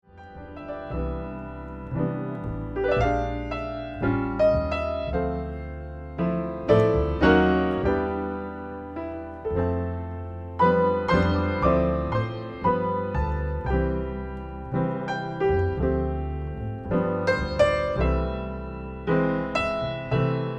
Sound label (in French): Duo piano et contrebasse